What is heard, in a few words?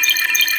C5, Synth, BASIC WF; LORE